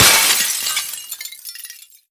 break glass phys large; physics; breaking

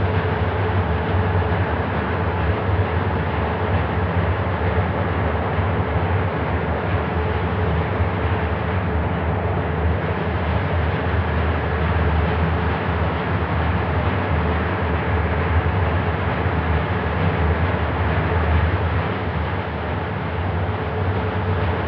interior, ambient; nuke

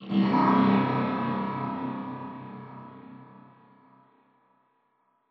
strider distant; Half Life Alyx; combine; c17

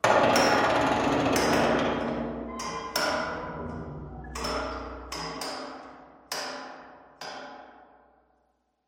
pipe bang, metal; Half Life Alyx

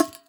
vent claw, headcrab classic; headcrab classic, scripted; creatures

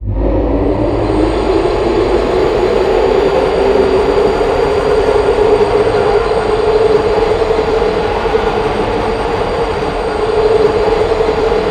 elevator depart; Portal 2

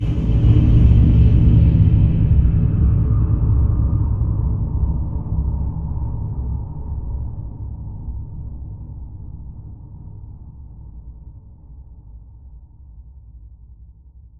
cables blast occ ext; Half Life Alyx; music; vault

